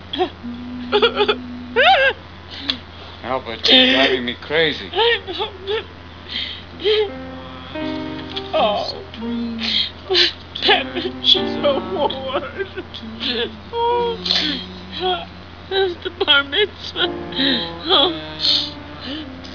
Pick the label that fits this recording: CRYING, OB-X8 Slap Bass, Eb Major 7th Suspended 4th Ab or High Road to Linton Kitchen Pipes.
CRYING